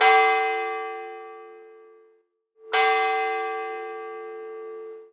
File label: church bell